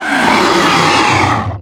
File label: walker big vox death; The Monster Plane; kolmods death